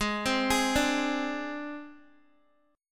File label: Ab Major Flat 5th Ab Guitar; AbMb5 arpeggiated; x,11,12,13,14,x